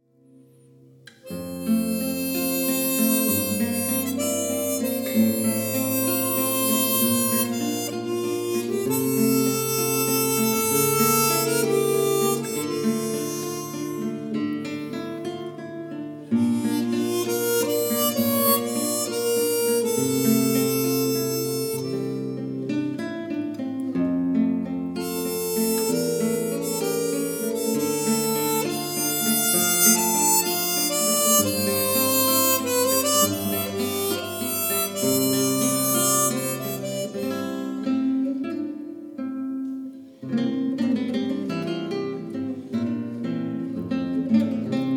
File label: regrets, harmonica